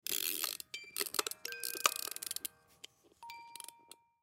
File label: Snow Globe Wind Up, Snow Globe Wind Up Musical Notes; Christmas Ornament Holiday; Foley; Blastwave